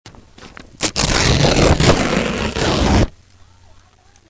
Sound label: tearing rice bag